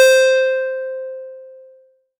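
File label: PLK C5, Roland MKS-80 synth pluck; Leads and Keys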